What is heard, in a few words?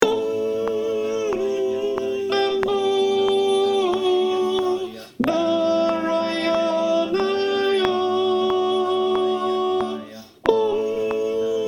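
Soprano, choir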